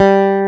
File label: E BASS G2, Bass Guitar, STACK BASS; AKAI S6000 CD-ROM - Volume 1